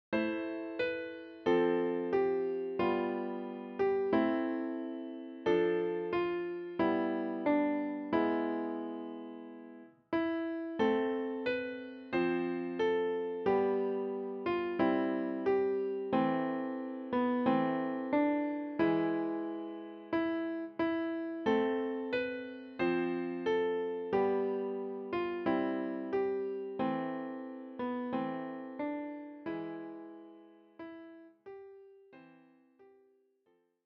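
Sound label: piano, für Klavier, leicht; Ich steh an deiner Krippe hier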